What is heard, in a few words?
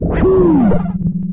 dog phrase; npc; source engine; episodic